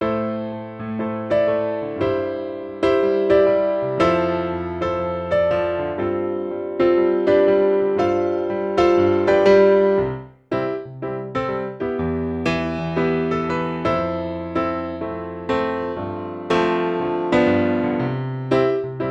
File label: INSTRUMENTAL